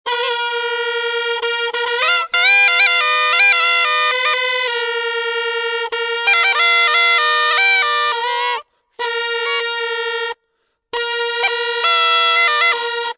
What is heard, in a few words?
diple, musical instruments